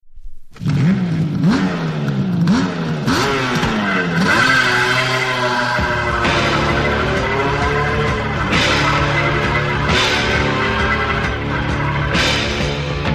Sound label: big wheel shake